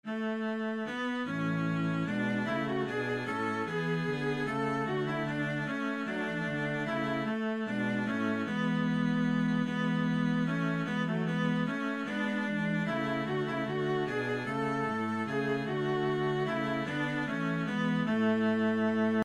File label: minuet Full, viola